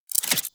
Reload; Warframe; Weapons; Zylok Prime

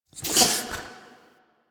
Idle Chuff; Ambience; Warframe; Entrati; Tagfer

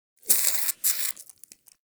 Wheeze Close

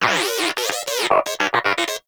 Moa Inquisitive Vocal Battle Ends; Pets; Warframe

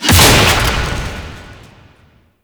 Shield Break; Vehicles; Abilities; Thano Mech; Warframe; Nechro Mech

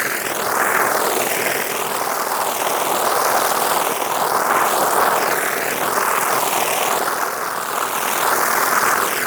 Flame Laser Loop; Warframe; Corpus; Corpus Railjack; Spec Ops Fire Moa

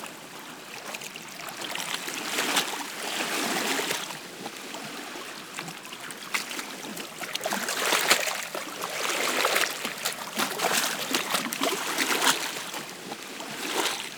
Water Ocean Close; Ambience; Props; Warframe; Eidolon Plains

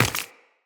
Run Flesh, Footsteps; Tenno

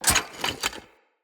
Shrimp Lantern Drop Impact; Creatures; Baliq

